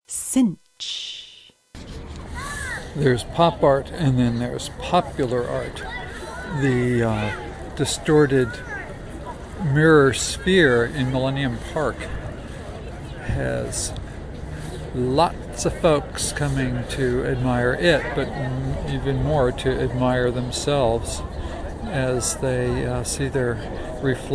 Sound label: sculpture draws crowds; millennium park